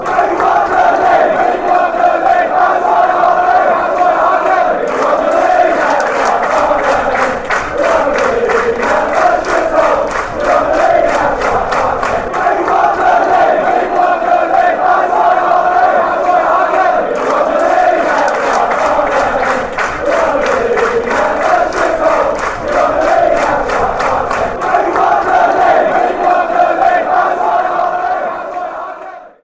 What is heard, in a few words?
we won the league, fan chants